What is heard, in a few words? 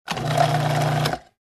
Mixer turned on